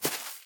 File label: footsteps grass Walk